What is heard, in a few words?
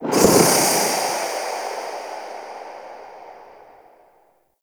Void Arena Ghost Death; Warframe; Quests; Wraith Quest